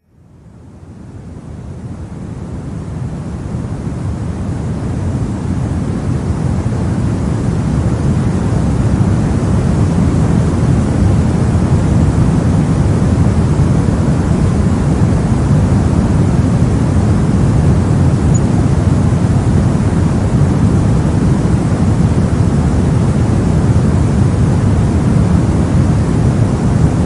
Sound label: White Noise; Emotional and Mental Wellness; Emotional Wellness Support; rife frequencies